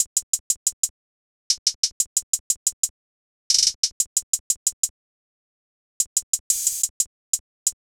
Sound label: Mask Triplet Hihat Loop - 120 BPM; Cymatics; Fiji Hihat Loops & Percussion